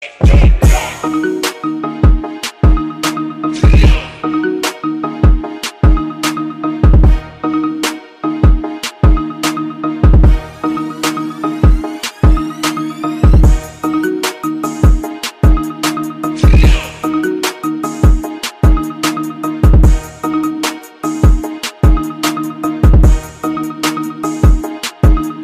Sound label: Marimba remix